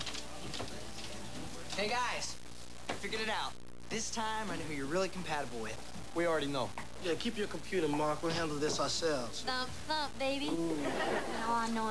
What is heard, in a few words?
thump; "Thump, thump, baby"; California Dreams